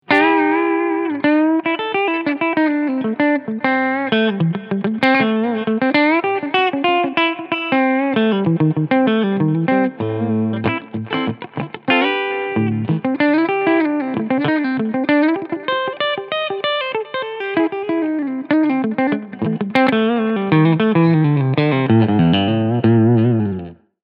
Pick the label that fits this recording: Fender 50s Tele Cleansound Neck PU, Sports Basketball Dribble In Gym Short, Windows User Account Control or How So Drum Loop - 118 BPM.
Fender 50s Tele Cleansound Neck PU